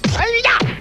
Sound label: martial arts "hiya"